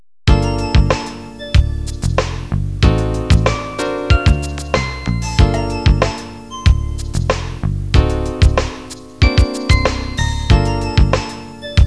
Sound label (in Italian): suonerie businessy